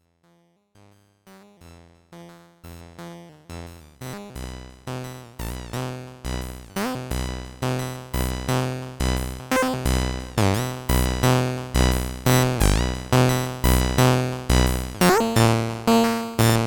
pro1 vocal

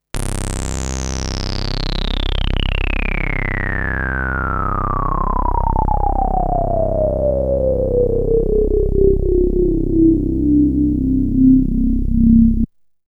VCF in max reso A

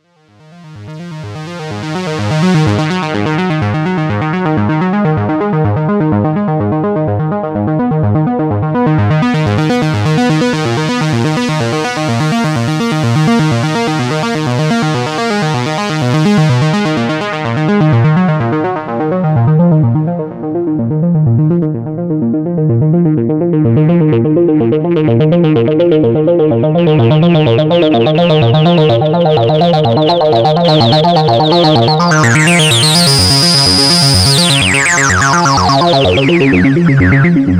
oscar arpeggio

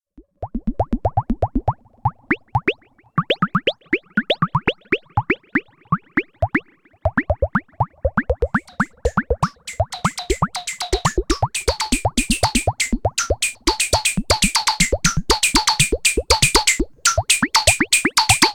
oscar FX

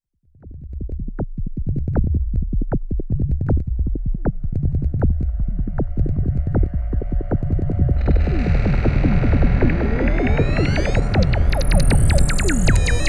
SUPER6 FX, synthesizer

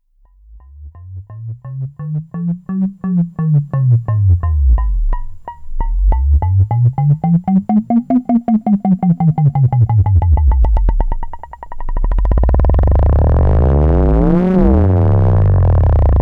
Sonic Six ring mod, synthesizer

